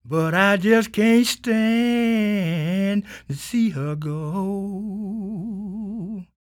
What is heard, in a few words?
SING, Blues Soul; Spectrasonics Vocal Planet